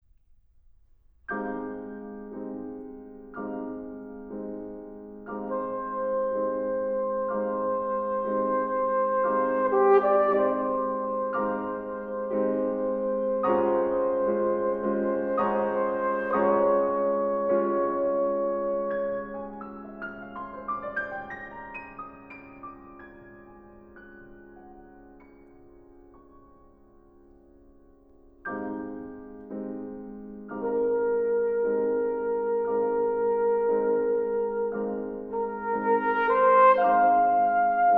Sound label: Sonata for Trumpet and Piano Hypnotic